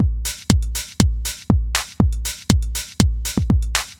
House Loop 120bpm

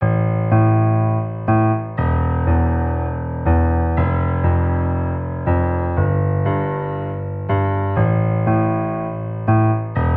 Piano Bass 120

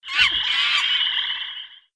Jungle Sounds

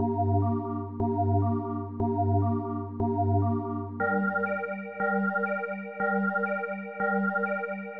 Morbid Church Organ

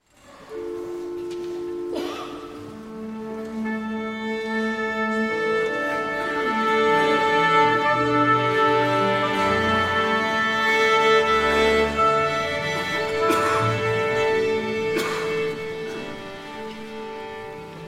Orchestra Tuning

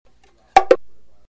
Bongo Loop

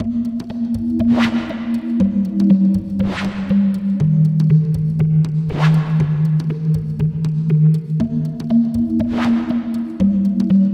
Melody D#

